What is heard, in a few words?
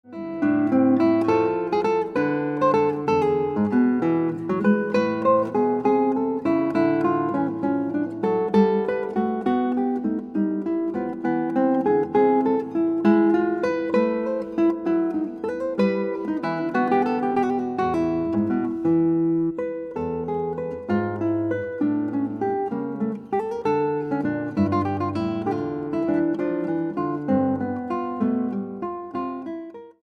Guitar